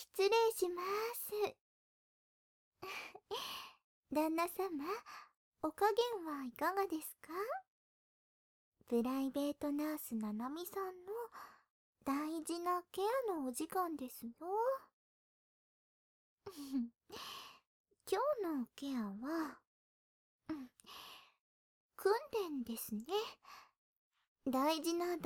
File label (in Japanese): 耳舐め手コキ; 七海さん早漏防止の耳舐め手コキ; 右耳に反転; ナース